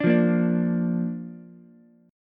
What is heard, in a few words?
A sharp Major, Ukulele; strummed; chord; JGuitar